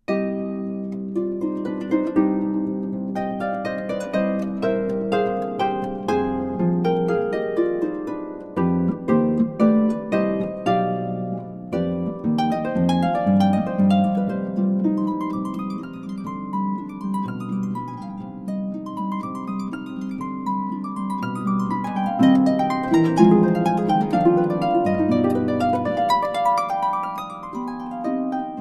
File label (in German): C Moll Sonate Allegro